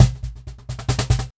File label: Cajon Fill; musicradar